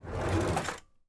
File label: Drawer Open; Haunted Bloodlines; SFX Producer